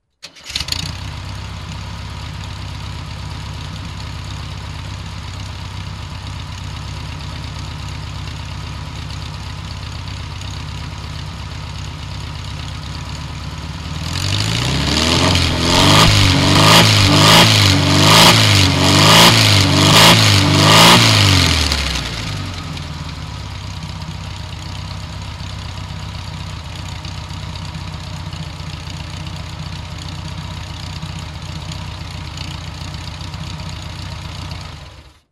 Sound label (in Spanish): Motor; MisReparaciones